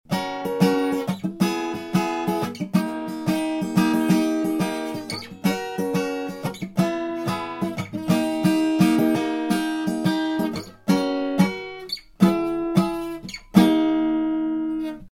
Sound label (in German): Gitarre